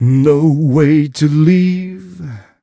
m 128 mel e-flat, Vocals

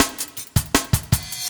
LOOP SD, 160 BPM; Ueberschall; Drum & Bass Flash Trackz

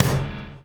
DOWN, DOWN KIT 78

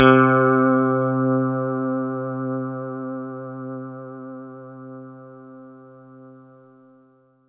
C4, Synth Line

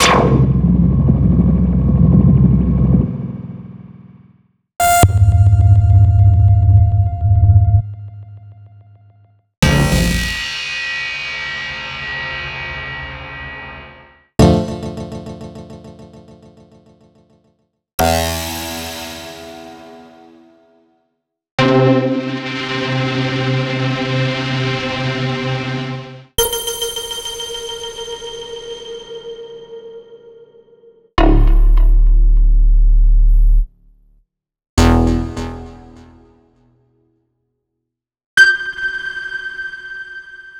cinematic electronica Stabs

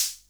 Cymbal, Korg MP7

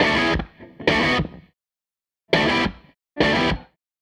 Gretsch Guitar Loop

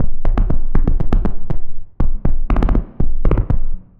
Stomp Perc; Sonic Foundry; The Electronic Music Manuscript Collection; Drum Loop Structures

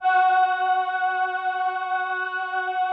VP-330, String Machines

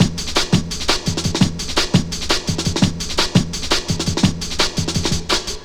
Sweet Beat, Drum & Bass; Fluid Dynamics

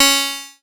C#, PIANO; Big Fish Audio; Synclav FM

